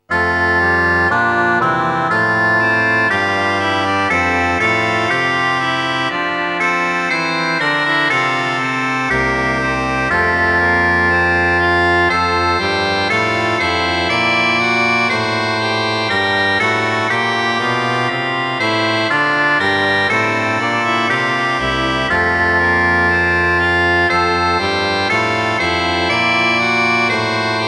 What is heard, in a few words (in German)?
nun danket all und bringet ehr, orgel